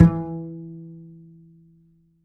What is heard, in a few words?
D string upright bass; Upright Acoustic Bass